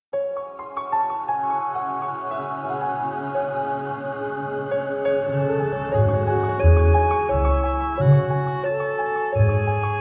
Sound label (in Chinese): 片头音乐